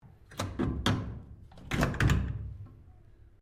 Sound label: BRIDGE PHONE RECIEVER UP DOWN, Sounds onboard HMAS Castlemaine; Boats; Vehicles